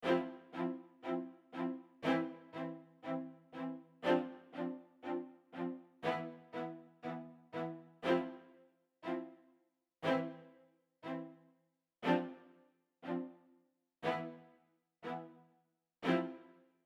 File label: String accompaniment for rhythm